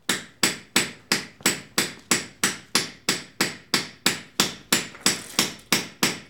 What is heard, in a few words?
Concrete Hammering